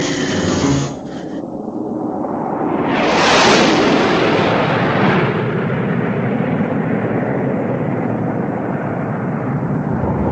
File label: Relaxed Soar